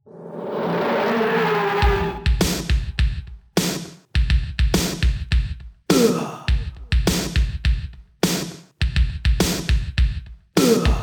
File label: Jingles